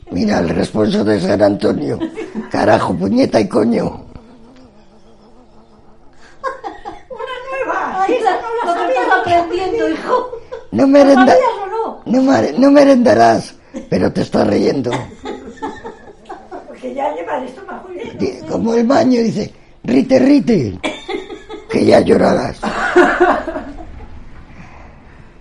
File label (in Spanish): responso; san antonio amusco